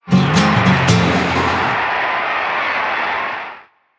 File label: toreador scatter; games; Corrida Romance; Wazdan